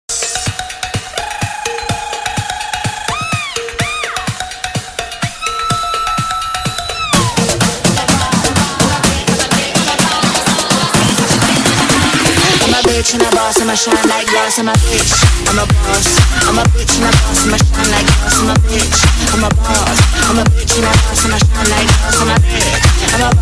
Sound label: Remix Ringtone; Harley Quinn